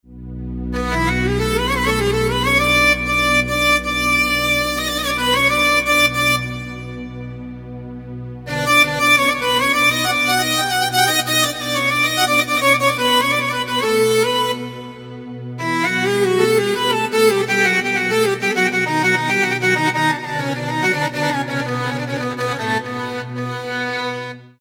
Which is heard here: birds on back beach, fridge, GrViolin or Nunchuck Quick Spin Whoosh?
GrViolin